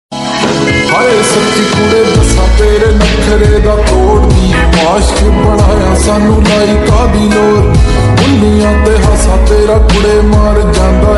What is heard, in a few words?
slowed reverb ringtone lofi remix ringtone bgm